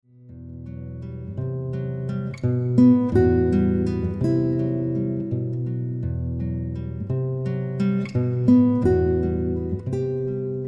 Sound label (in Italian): Milonga blu chitarra